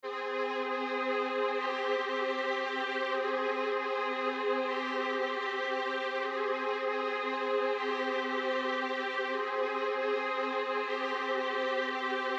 Sustained Strings, 155 BPM F Min; Cymatics; Domination

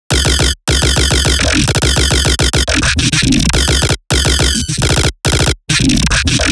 Riddim Bass Loop Artillery (140); Ghosthack; Riddim Essentials 2020

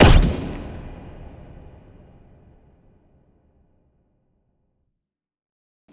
impact electronics; Hits, Impacts

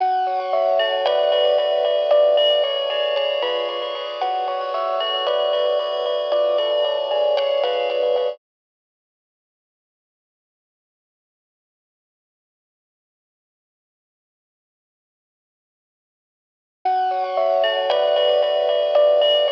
114 BPM F# Min Delay Bells; Trap Melody Loops; Loop Stems; Cymatics; Nightmares; Fireflies

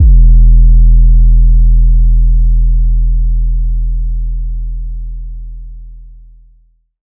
808 E1; Trap and Dubstep

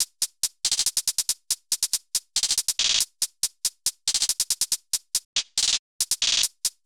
Hihat Loop - 140 BPM, Hip Hop; Drums - Loops; 9God

